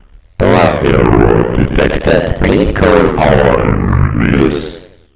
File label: door error